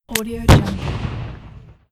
explosive demolition trigger and door breach charge